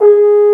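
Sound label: French Horn p G#3; Digital Sound Factory Virtuoso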